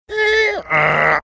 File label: making funny sounds backmasked